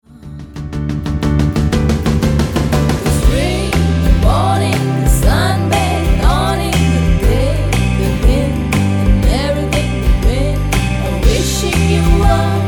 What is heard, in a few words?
HAPPY LOVE DAY FEMALE ENGLISH